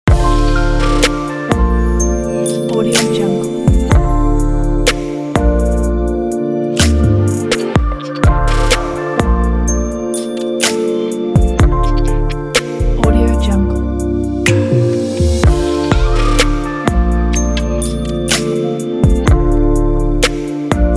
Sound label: chill trap